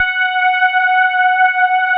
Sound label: electric pianos; Wizoo Electric Pianos